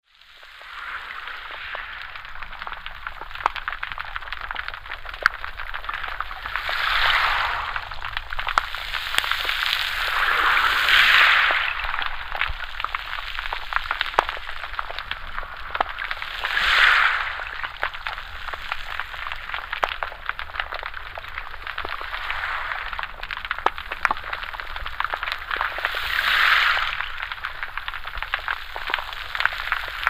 santamarianavarrese ocean surf with pebbles, underwater